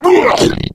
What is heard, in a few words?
male zombie growl; Left 4 Dead 2